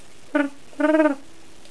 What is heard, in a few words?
pigeon